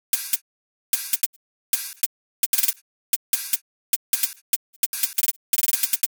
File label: Dubstep Drum Loop Cymbals, 150bpm; Full Drum Loops (Stem Seperated); Ghosthack; Dubstep Sound Arsenal